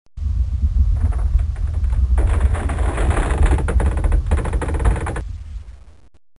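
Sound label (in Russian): землетрясение деревянный дом звуковой эффект разрушения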